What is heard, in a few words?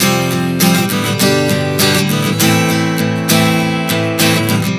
Fadd9-G-Am7 100 bpm, acoustic guitar chord progressions